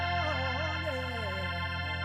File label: Stereo Mix; MyMix Recordings NAMM 2018